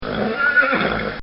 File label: Horse Whinny; Horse Whinny 5; Horses (Sound Effects:Animals)